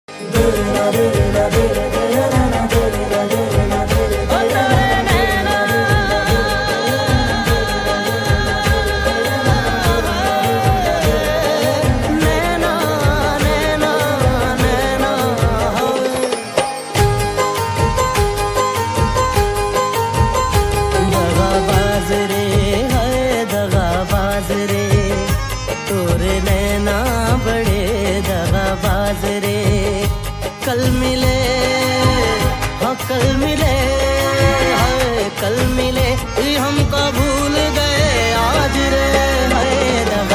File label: ringtone romantic ringtone love ringtone; salman khan